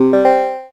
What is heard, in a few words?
finish level; watertowerclassic